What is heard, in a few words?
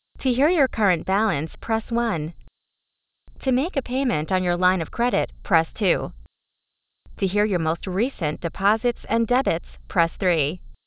Menu Medium Slow